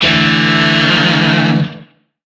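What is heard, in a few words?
Shawzin Pentatonic Major Chord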